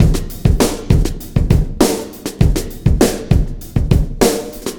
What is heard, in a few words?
Sky Lab Beat, Processed Drumkits